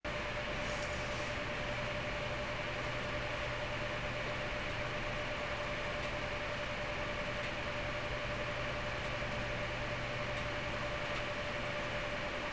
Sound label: R5770 80 percent; R5770Hark